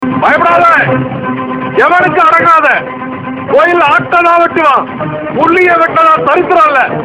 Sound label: Love Tamil Ringtone